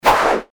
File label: fight swish back